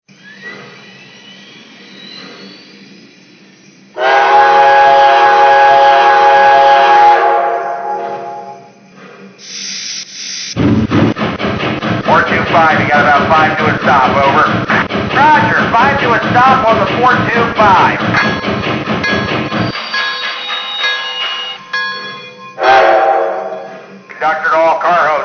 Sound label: USRA